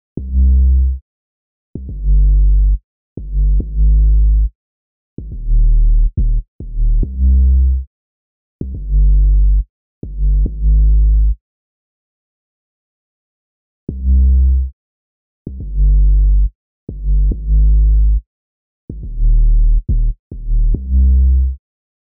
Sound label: 808 Bass Side Chain